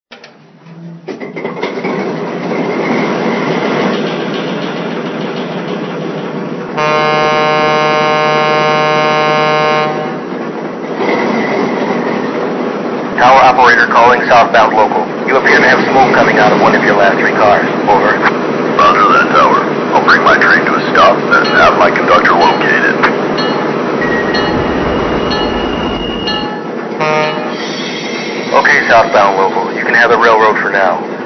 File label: ALCO RS-3